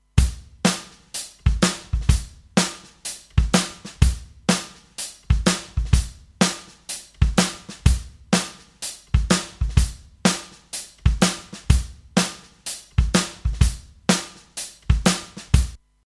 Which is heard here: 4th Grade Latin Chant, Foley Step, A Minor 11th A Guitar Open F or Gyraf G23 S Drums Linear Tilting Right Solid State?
Gyraf G23 S Drums Linear Tilting Right Solid State